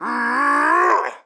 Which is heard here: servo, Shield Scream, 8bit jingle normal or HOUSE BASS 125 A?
Shield Scream